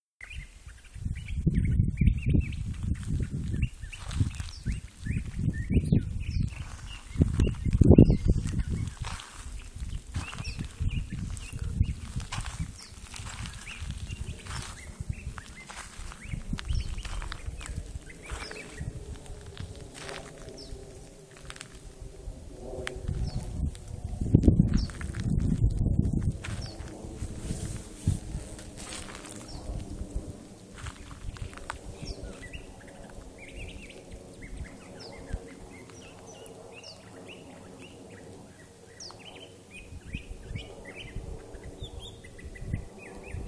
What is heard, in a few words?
Ironwood forest songbird, Sonoran Desert